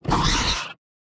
Fellwing Damaged Short

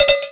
Sfx Winter games

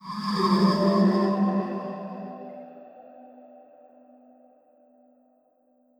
lament; Sarco Isis

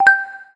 Sfx Darts

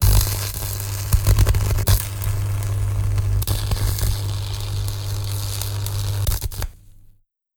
Electricity, Long; Legend of Keepers

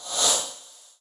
Music Frontend

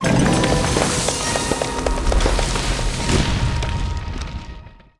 SFX Building Upgrade; Academy

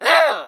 Attack; Pecheneg Gray

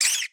Rat Attack

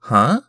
Chorus confuse

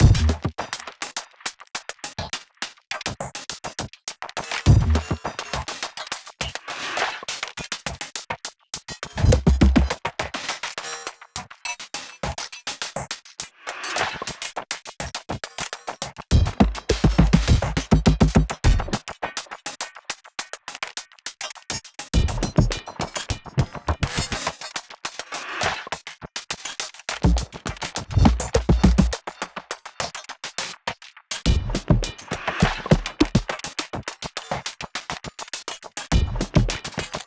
music drums Fight; music ftue drumsFight 03

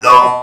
Male Hurt Robot; Mars Sortie (Arcade) (gamerip) (2018)

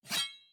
sword spin attack begin; unsighted

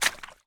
Footstep Puddle

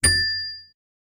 bell puzzle

